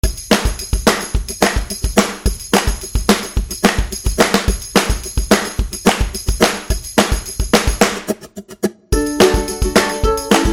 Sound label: Uplifting Folk Happy